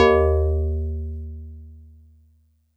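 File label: StarPiano DX100 D#0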